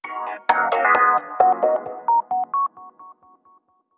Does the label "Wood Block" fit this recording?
No